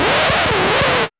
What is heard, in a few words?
SCRTCH